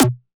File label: Low tom; Roland MV8800; HARD MM KIT